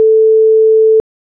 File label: pulses; PiFmRds